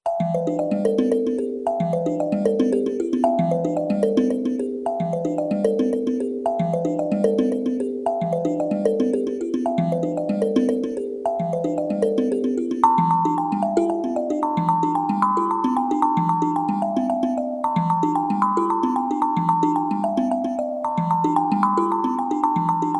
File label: sad mbira music